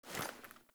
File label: Foley Walk Default, Default Clothing; Characters; Player